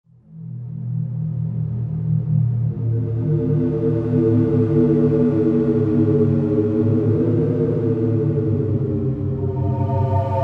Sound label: MKS80 Vortex, Vintage Synth; Roland SR-JV80-04